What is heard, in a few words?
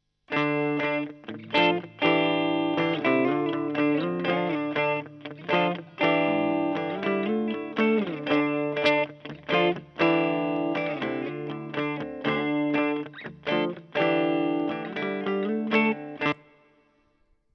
Shure SM57 real; Torpedo CAB M